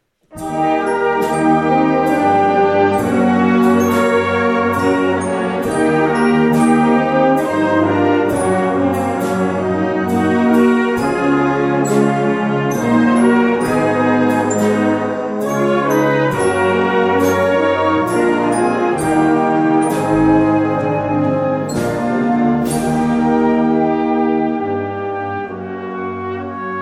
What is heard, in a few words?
in love ballade